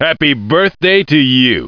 happy birthday to u

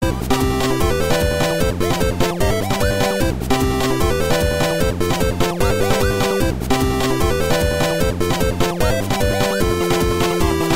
8 bit

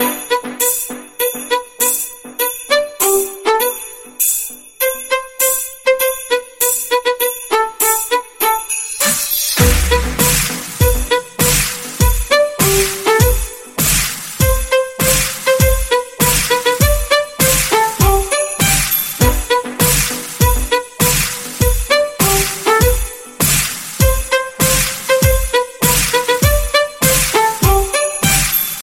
jingle bells

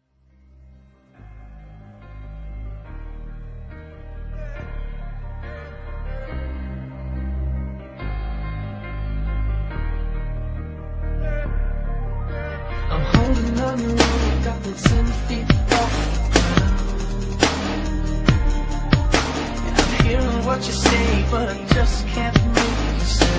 dubstep remix

